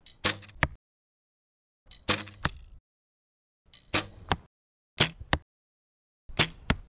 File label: Arrows